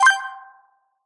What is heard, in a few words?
Darts